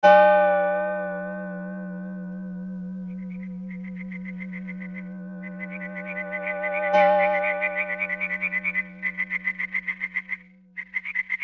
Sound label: spec crossfade